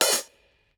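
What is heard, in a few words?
open hi-hat; eddyflux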